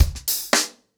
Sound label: 110BPM, acoustic drums; The Roots Drumloops